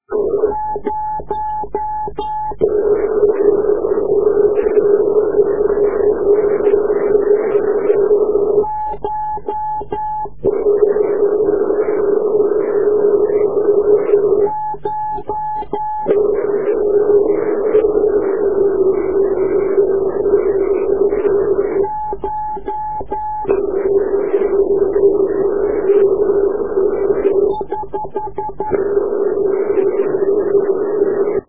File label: echo, 432Mhz